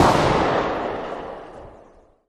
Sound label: bizon distant; weapons; Counter-Strike Global Offensive